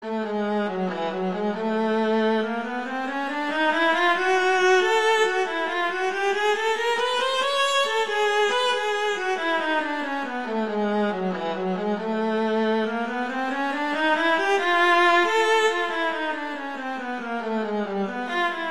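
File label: Chromatic Study, viola; Palaschko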